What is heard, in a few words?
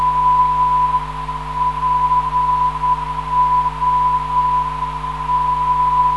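319kHz, non-directional beacon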